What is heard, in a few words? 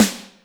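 Snare, Alesis D4 drum machine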